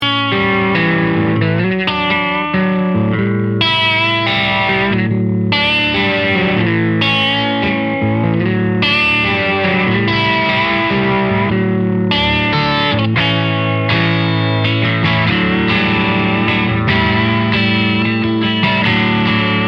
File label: Guitar Hi PWR Hi WRP